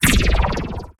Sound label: Energy Burst, High Tech Interface Sounds; Lesser Vibes